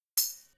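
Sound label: Drum Analog Kit Tambourine, Yamaha RM1X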